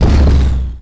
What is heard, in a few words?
tank gun; WAR